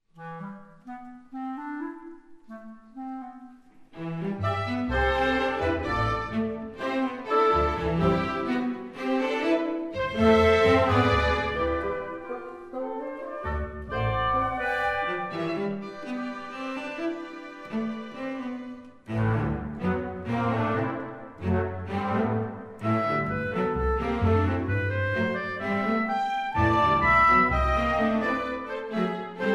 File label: Rumba Fives; Nemo; Little Nemo Ballet Tableau 2